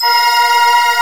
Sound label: ENSONIQ VFX GLORY; Rubber Chicken Software Sample Bank